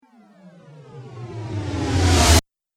Cinematic Riser Abrupt Stop

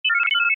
Computer Beep: Odd Effect; Communications; Communication Sound Effects; Communications (Sound Effects:Science Fiction); Stuart Duffield

